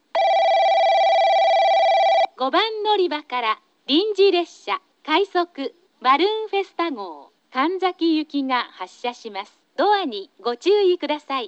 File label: balloon hizenyamaguchi rapid kanzaki, portable recording